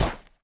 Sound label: footstep; Smite; god Kuzenbo v2